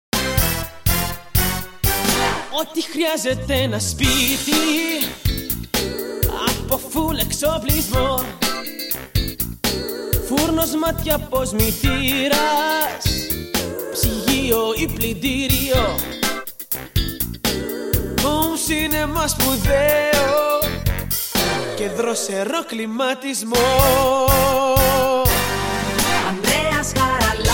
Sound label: Jingle